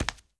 flesh, player steps; quake2

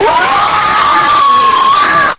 demon

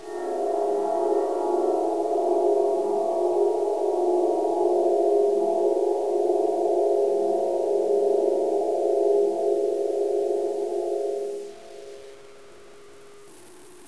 horror

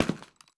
boot, player steps; quake2

